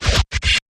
Short Stutter

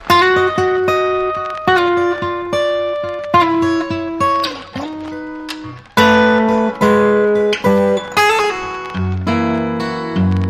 Blues

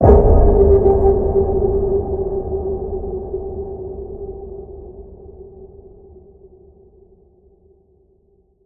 Deep Waters Ping, G

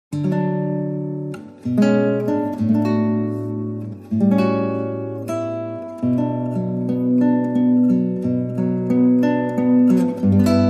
Solo Guitar

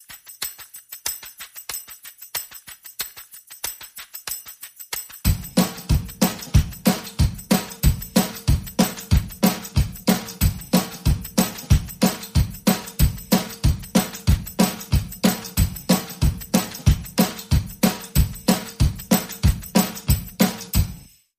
Perc Only

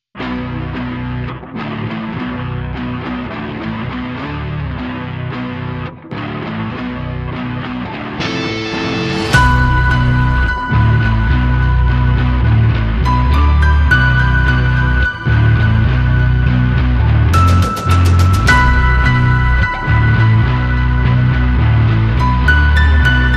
Ticking Timebomb, Underscore

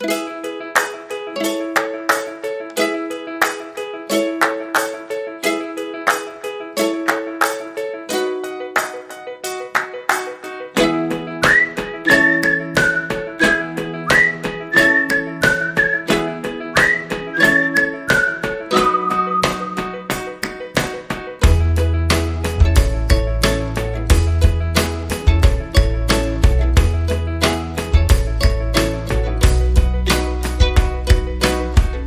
No Vocals

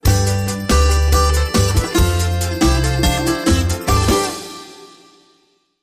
Glenti

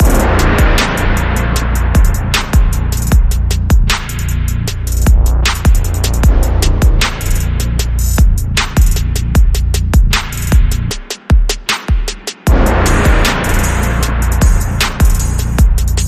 Groove And Bass

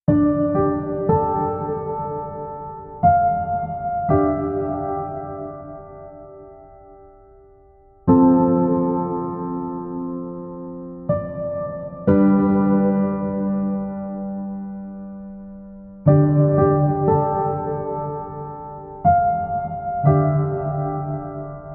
Piano Mix

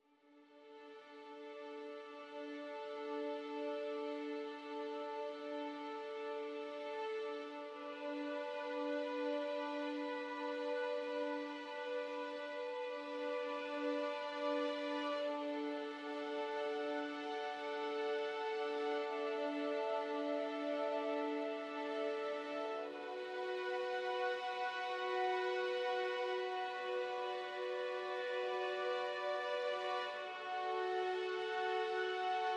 Strings Hi

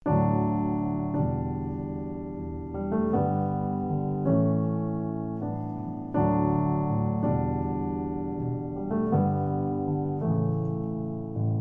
Solo Piano

